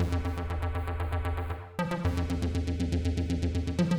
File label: Bass Loop (120BPM); cinematic drama; Loops; musicradar